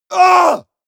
male combat hit hard

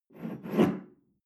espresso grab tablecloth slide

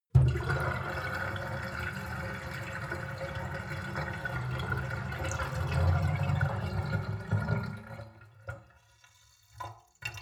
sink filled pull plug seep; industrie-wirtschaft; gastronomie

